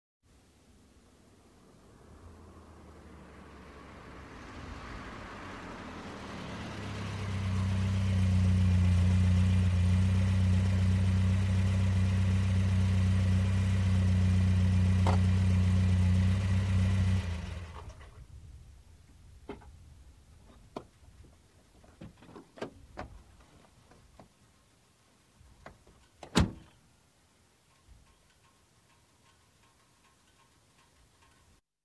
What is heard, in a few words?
Golf GTI Approach; Sound Ideas; Series 2000